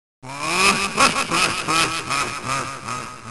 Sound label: boss laugh; vectorman 2